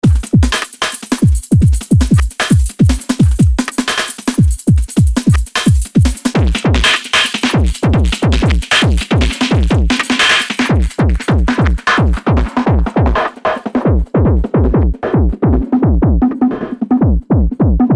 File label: synth warmer idm kit